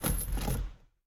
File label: foley hit; driver; Blind Drive